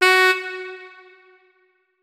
notes, keyboard saxophone; Hideout Furniture

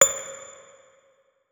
notes, guitar; Hideout Furniture

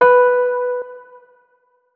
notes, keyboard, electric piano

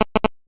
cell noise; Devices of Anomaly Redone; catspaw

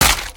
wpn large, human step; Footsies

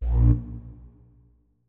flute notes; Hideout Furniture

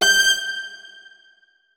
notes, keyboard; Hideout Furniture

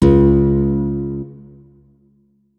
notes, guitar; Hideout Furniture

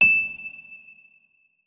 notes, keyboard, electric piano

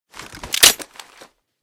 unjam, weapons; RG6; RG6 Reanimation; librarian rg6

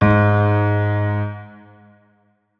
notes, keyboard piano; Hideout Furniture

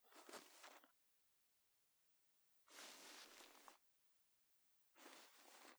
pp2000 bore; Blindside's Weapon Reanimation and Rebalance - Military; weapons

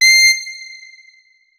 notes, saxophone; Hideout Furniture

